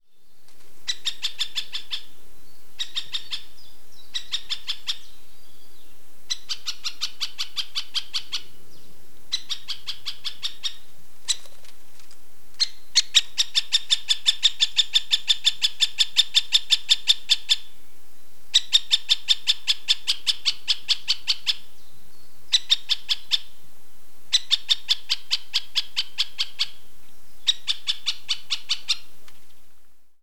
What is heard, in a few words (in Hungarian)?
közép fakopáncs riasztó hang